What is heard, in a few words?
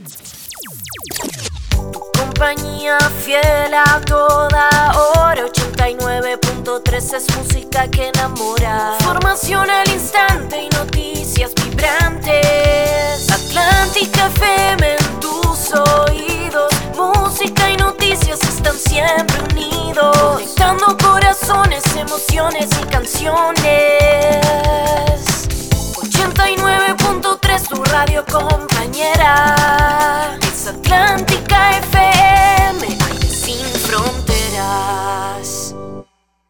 jingle